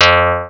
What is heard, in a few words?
bass, pocket calculator; pocket calculator 24